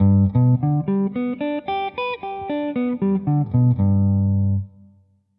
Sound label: Arp maj, scales; scales Gmaj